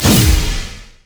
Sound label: double attack; LoL; monkeyking